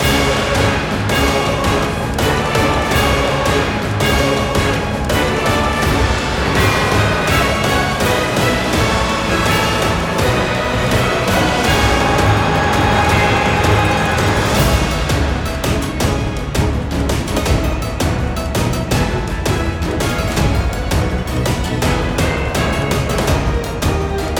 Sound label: Dramatic loop